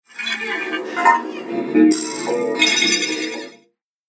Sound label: Horror Machines, horror sound effect; Scary Soundscape Sound Effects; Horror Machines - Dark Apprentice